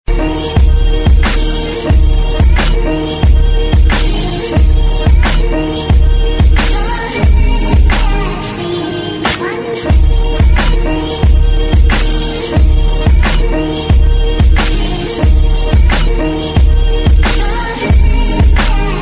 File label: hip hop bit ringtone